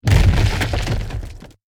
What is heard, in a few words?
Torre Destroy